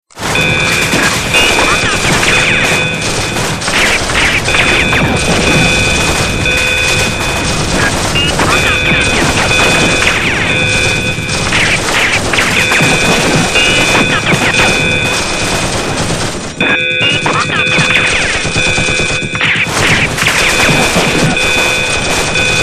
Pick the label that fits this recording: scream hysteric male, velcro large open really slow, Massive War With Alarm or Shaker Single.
Massive War With Alarm